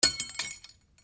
FALLING

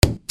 OFFICE

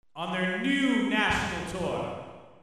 PEOPLE

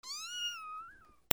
ANIMALS

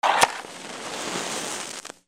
FIRE

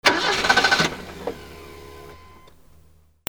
CARS

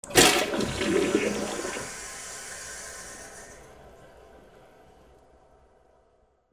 BATHROOM

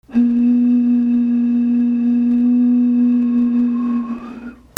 WHISTLES

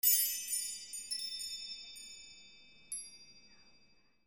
BELLS

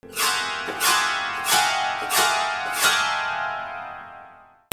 MUSICAL

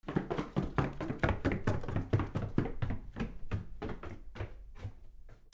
FOOTFALL

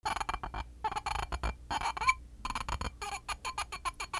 FRICTION

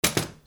SQUEAKS

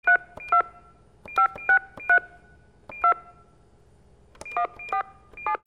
PHONES

